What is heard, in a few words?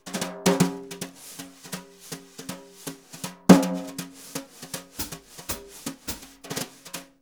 BRU, BRUSH; Univers Sons; Jazzistic